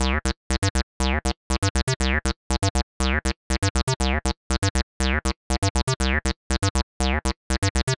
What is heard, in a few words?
120 Cm bass, bass loops, trance; Trance Explosion Vol1